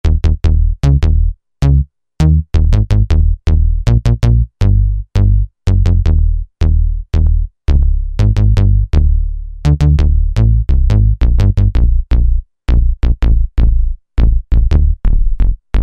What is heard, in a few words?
Ba-Lately Bass, Yamaha EX5R; Factory presets